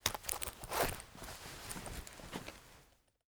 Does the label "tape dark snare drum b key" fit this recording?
No